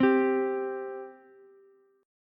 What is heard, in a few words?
C 5th C Ukulele; C5 strummed; x,x,8,10